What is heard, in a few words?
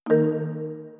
Error Sound; KShare